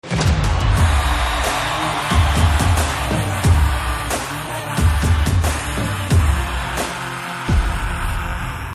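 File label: Trailer background music Ringtone; Pushpa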